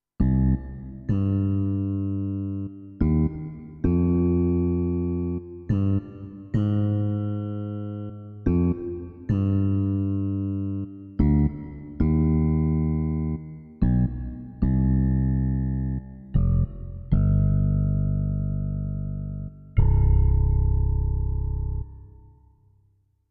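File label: X Wire Bass, digital piano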